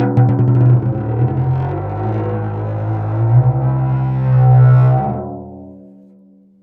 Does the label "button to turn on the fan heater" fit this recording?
No